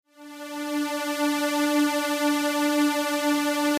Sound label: pad